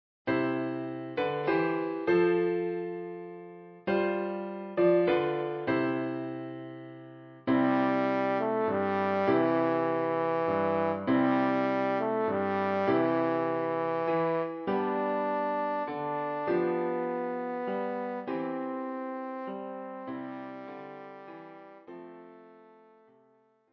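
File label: Silent Night, Horn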